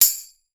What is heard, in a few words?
TRASHY, TAMBOURINES; Voice Crystal - Steve Reid Definitive Percussion